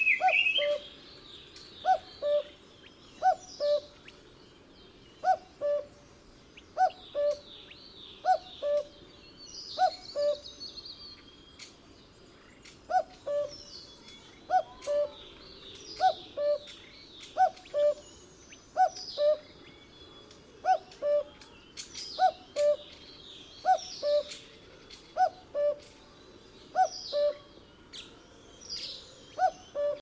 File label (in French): coucou